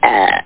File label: belch; Muscarine